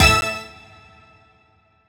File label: Misc Stab